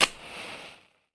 light match

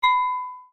glass hit

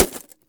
bullet hits glass

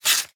paper cut

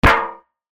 metal can bang

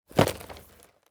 box drop

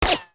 bullet fly by

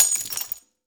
glass bottlle smash

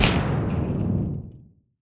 explosion bang

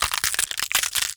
bones break

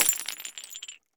metal pieces concrete

